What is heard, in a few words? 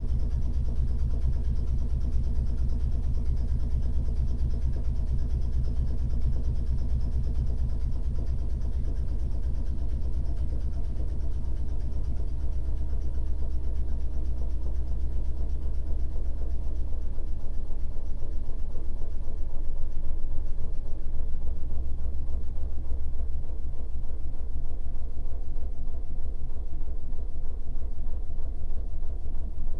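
fishing boat trawler onboard fast drive decelerate to slow interior compartment; holophone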